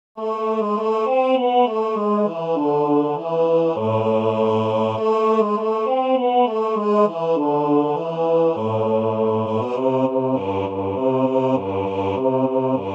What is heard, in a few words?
Bass